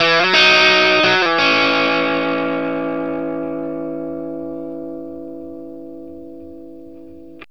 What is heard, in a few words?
BLUESY, Vintage Blues Guitar; BLUESY 60; AKAI; USB Soundscan vol.22